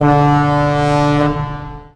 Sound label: shiphorn; Oslo havn; Oslos sjøside